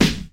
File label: rich lows snare drum b key